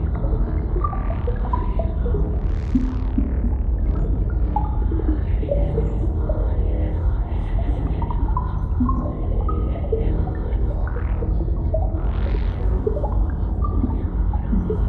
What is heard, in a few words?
ship science ship idle; Stellaris; shroud